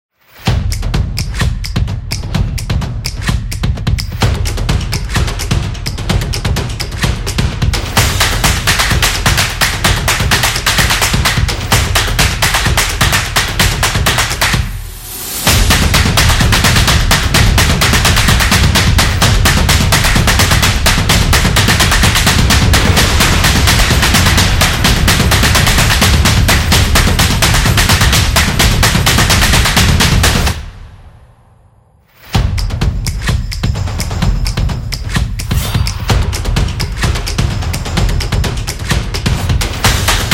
stomps and claps; Parking

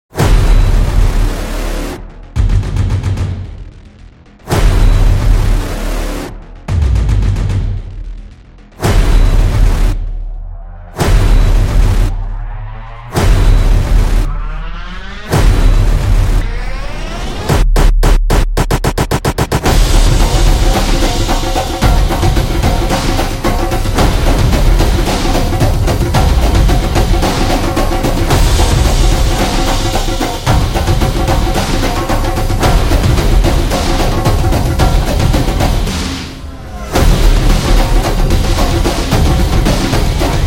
Sport Music

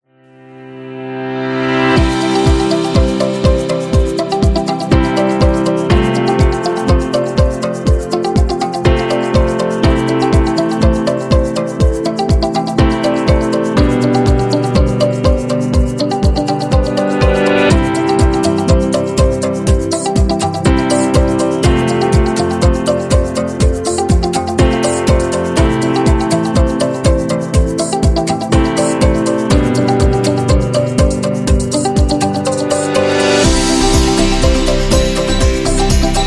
Background Beat; eco-friendly

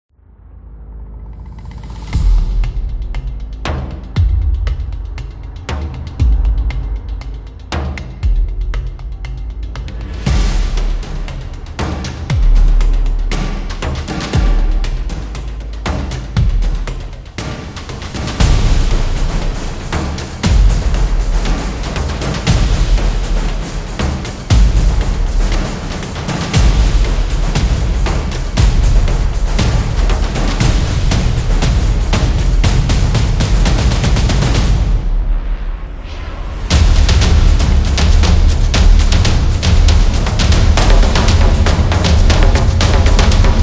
Epic Drums Action; battle drums

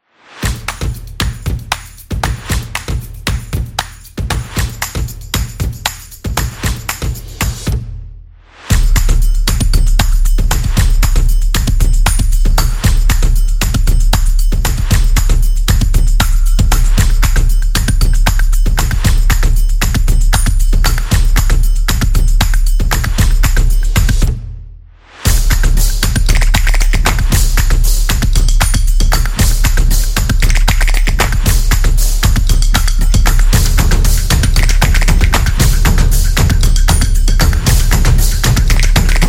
Fast Pace; casual game

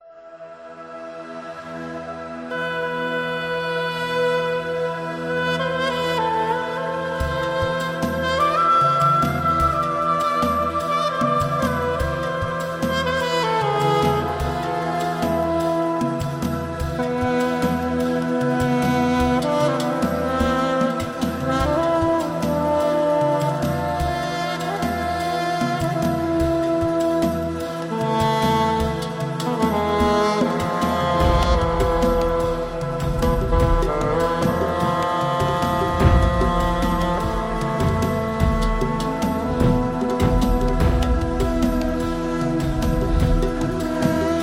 Traditional Arabic; ramadan 2025

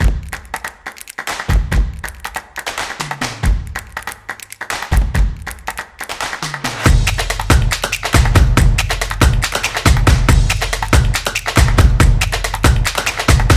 Upbeat Stomp

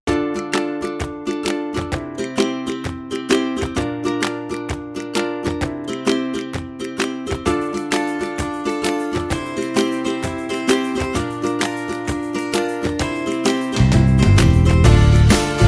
Country

